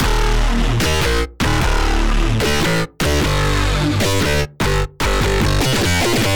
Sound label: Dubstep